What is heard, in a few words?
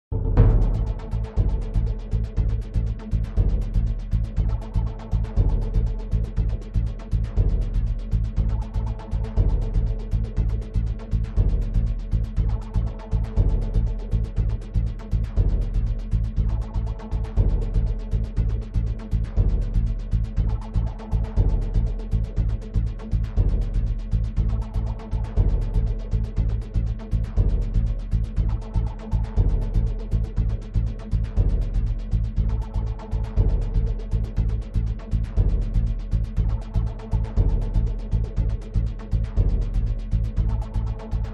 music tense quiz bed; zapsplat